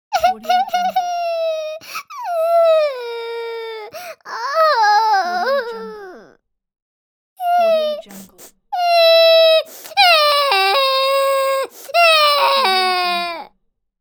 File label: cartoon cry